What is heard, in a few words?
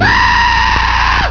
die; gnobots2